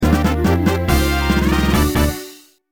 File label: Fanfare Styler Level Up; Ranger